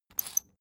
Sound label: salt mill squeak